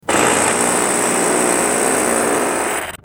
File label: never ending long fart